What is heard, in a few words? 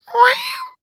Cartoon Human Male Cat Losing Its Voice Meow; AdobeCC